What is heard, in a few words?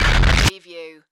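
Production Element Distortion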